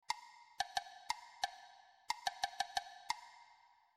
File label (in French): rythme